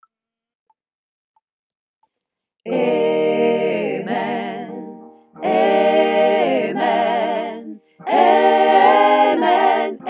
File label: Amen Let it shine SATB